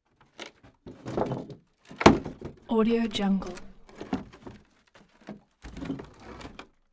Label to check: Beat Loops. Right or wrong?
Wrong